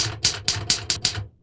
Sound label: typewriter